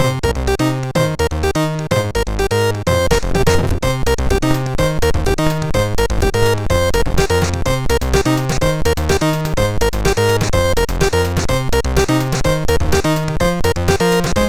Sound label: sid, MOS6581R3; 3 Days